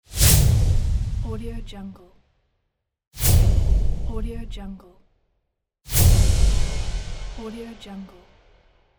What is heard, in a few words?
wosh accent